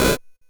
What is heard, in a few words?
Drums, Hits; DCM8